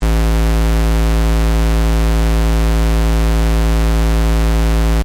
Square Wave 55 Hz Minus 12 dB Loop, Reference tones; Reference tones that can be used to test hearing and callorbrate equipment